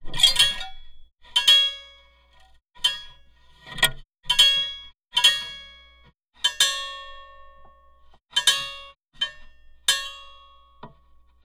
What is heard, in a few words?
Typewriter spring door open; SkewSound; QWERTY